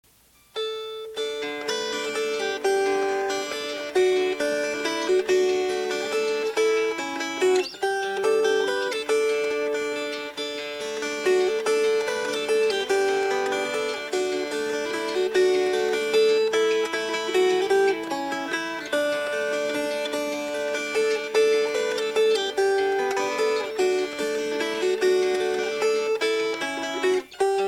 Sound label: Fingerpicked